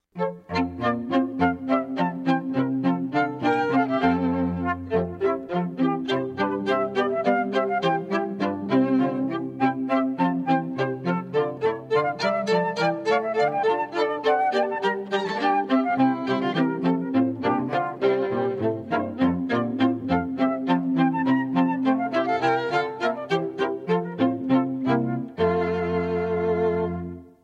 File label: Water Music Bourree (Flute Trio); Handel; Cantilena South Florida